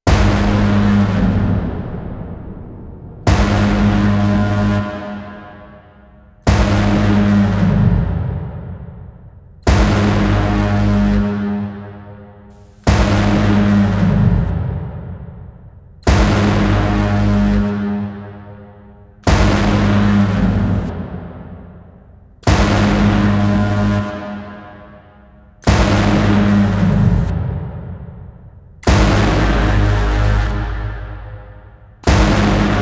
Countdown Hits Only

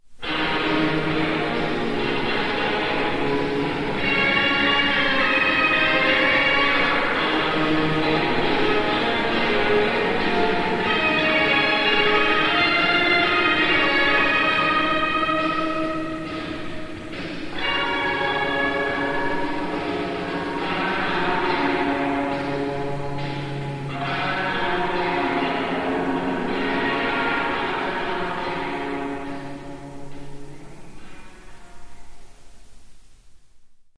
Short Instrumental Hidden Track

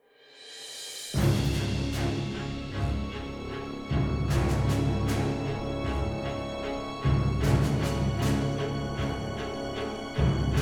(No Choir), Orchestra

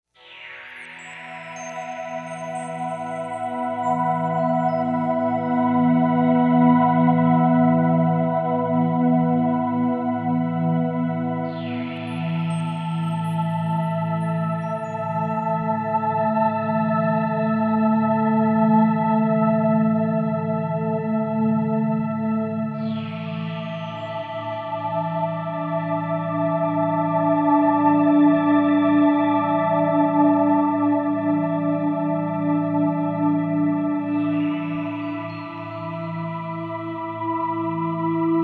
Out The Door (No Drums)

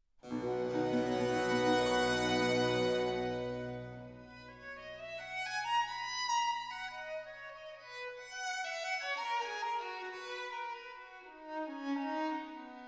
Concerto Ripieno In C Major Adagio

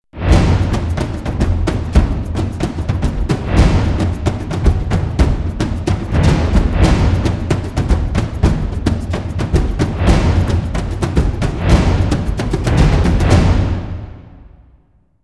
Hybrid Drums; трейлерная музыка

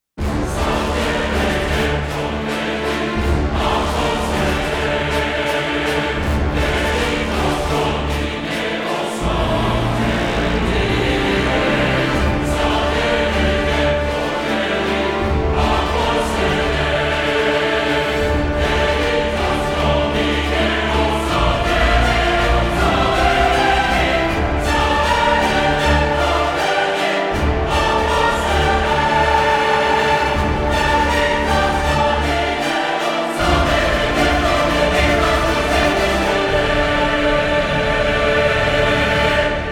The War Continues, Orchestra And Choir Only; трейлерная музыка

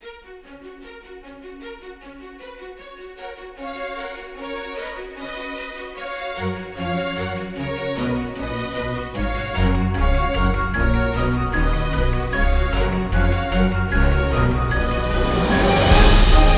No Choir; trailer music

